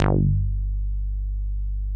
MOOG1 BASS G1

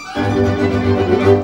VIOLIN HITS; Best Service; World Colours

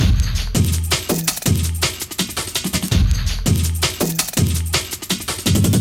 Break, Loops

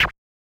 SCR, Yamaha DJX 2B

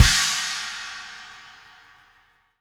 CymKick, Latin Percussion; Sonic Foundry (Sony Creative Software); Joe Vitale Latin Percussion

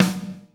SNARE, ACOUSTIC KIT; Greytsounds VOL-1

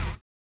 collision; Spectrasonics; Metamorphosis

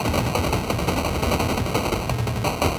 8 Bit Fire FX, Loops, breaks; m8 breaks

